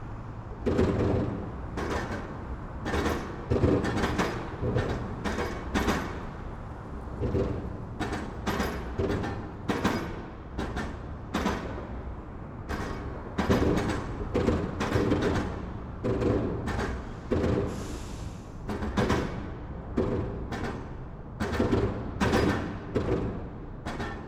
Urban traffic moderate elevated metal plate distinct; urban and suburban; boom library